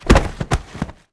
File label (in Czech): telo pad; Mafie 1